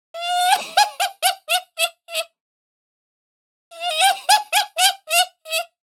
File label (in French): Rire strident de sorcière